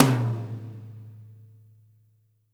TOM, ROCKER KIT

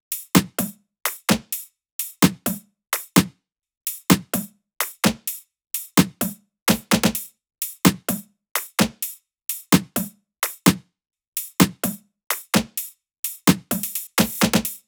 loop top 128, Seismic Loops

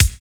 BRITE KICK; Drums In Your FACE!

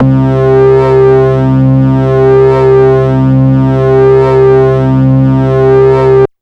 MOOG SYN, Synths

